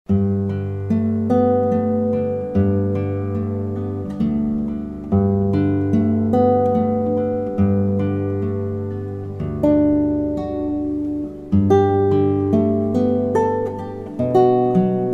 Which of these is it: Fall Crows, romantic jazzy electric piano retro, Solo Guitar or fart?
Solo Guitar